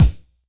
Punch High Clicky, Kicks; Airwindows

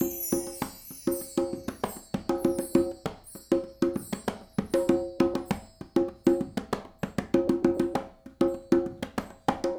Smooth Groove Congas Chimes, 70s Analog Funk; Sonic Foundry (Sony Creative Software); What it Is!

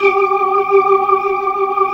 G4, Keyboards Old School; USB Soundscan vol.09; AKAI; BIG HAMM

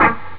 elec gt fx; jazz jackrabbit 2

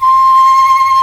SHIAO C4

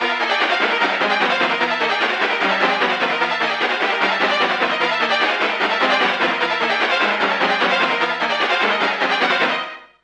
STRINGISH; Masterbits - Tekkno Trax; SQ LOOP 150

